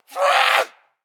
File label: chase, zombie; DayZ Epoch